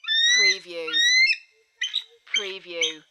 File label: American Bald Eagle squawks 2 times, then barks 3 times